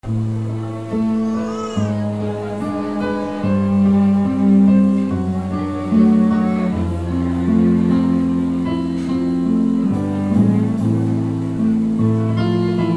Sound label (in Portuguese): Piano e Cordas